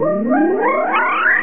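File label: water magic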